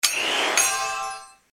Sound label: equipment forging